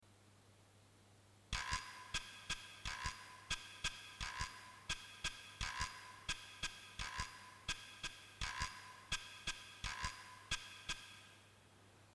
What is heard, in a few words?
quiro; Guiro: "Kurr-TsaKaKurr-TsaKa"; Cuban clave